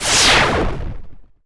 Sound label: Death; Guardian of Silverkeep; Sound FX